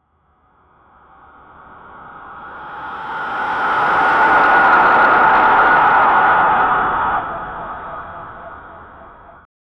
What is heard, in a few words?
persecution horror; suspense